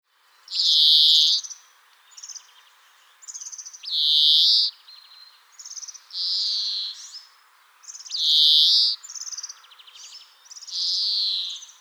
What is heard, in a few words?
Carduelis chloris